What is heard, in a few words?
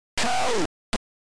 Title AY Beeper; The Arc of Yesod